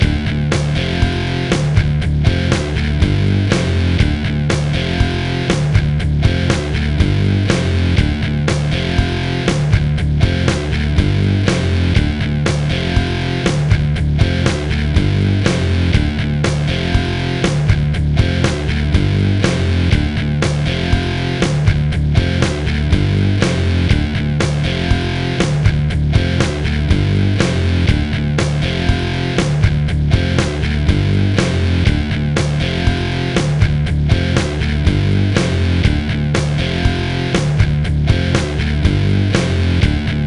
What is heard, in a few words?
Ditty; NBA ShootOut 2002; PS1